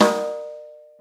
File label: Big Room Steel Snare Drum D Key; snare drum samples